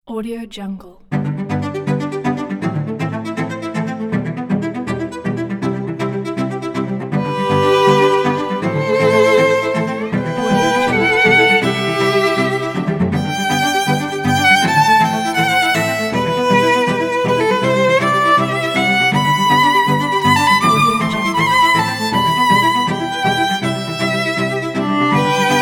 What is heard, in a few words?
inspiring violins cellos